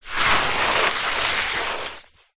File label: wet sand deep hand dig in; foley; zapsplat